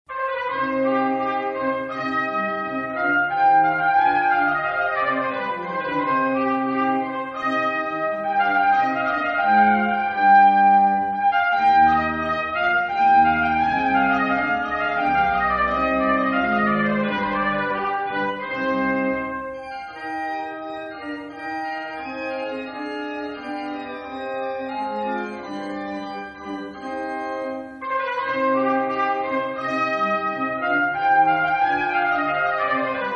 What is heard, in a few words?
rigaudon, trompeten; purcell